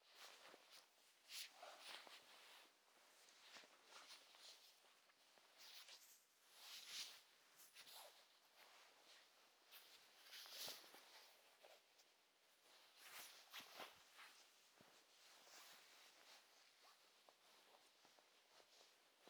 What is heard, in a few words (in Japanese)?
布団 もぞもぞ 左耳 へ; 動作系